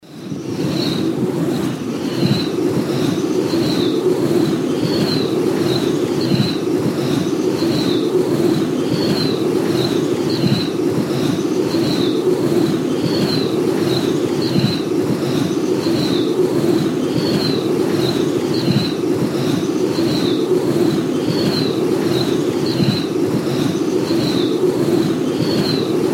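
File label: windmill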